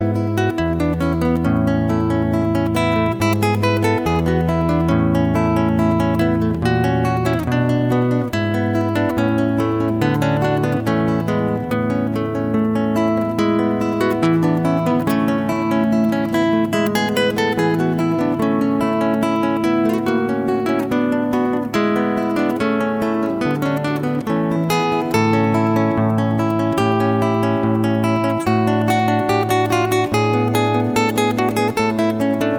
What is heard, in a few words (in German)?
sonate fuer floete und gitarre in d dur allegro; christian g scheidler